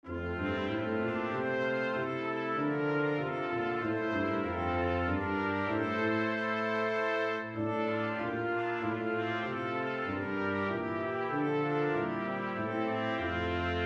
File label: Brass Quintet; Liedboek